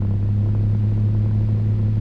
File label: Fan; Feel; NiceVibrations